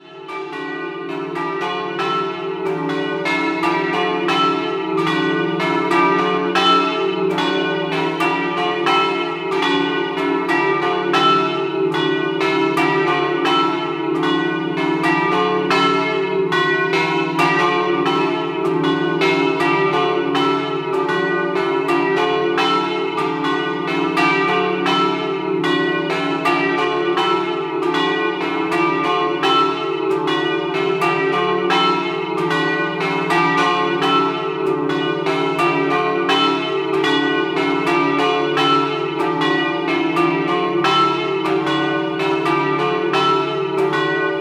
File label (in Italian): Tiezzo, campane